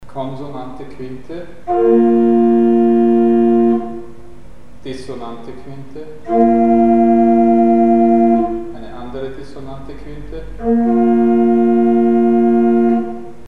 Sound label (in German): Konsonante und dissonante Quinten; Mikrobuch